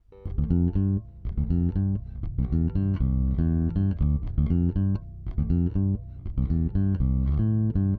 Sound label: Electric Bass; Basses